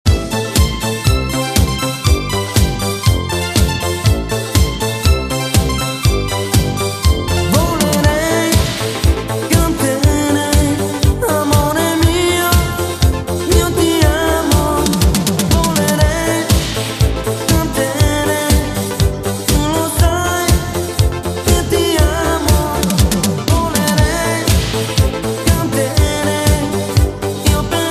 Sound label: Vocal